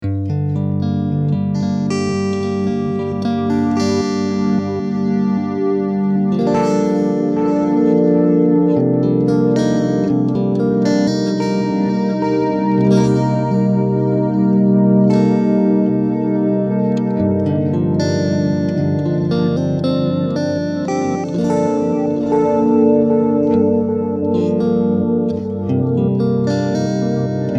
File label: Ambient